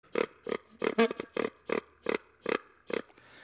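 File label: peccary sounds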